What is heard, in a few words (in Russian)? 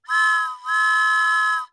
svistok